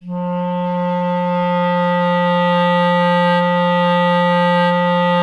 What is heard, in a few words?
clarinet F; Miroslav Vitous; Solo Instruments VOL-1